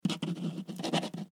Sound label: Office Pen Write On Paper; Pens; Offices; SFX Bible